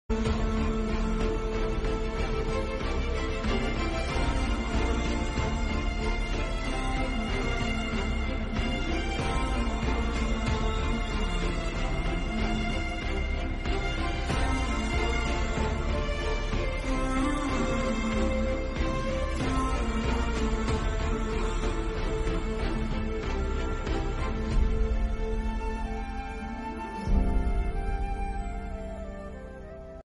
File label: motivational bgm